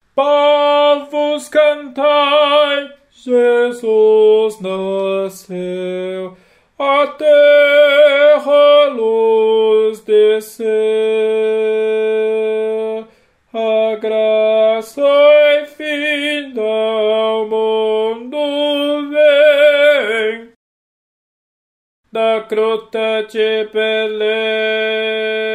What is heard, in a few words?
tenor